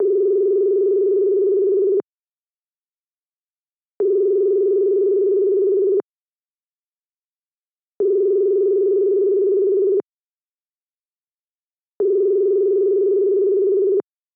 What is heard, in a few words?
phone